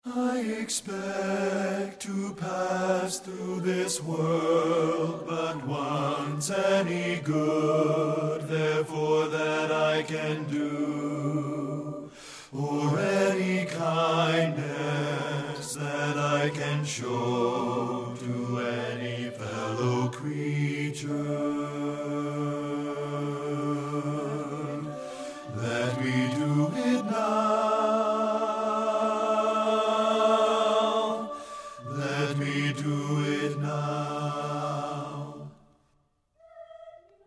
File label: BASS